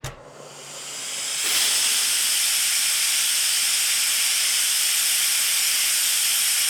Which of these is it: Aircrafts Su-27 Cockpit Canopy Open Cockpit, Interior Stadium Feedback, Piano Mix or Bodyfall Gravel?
Aircrafts Su-27 Cockpit Canopy Open Cockpit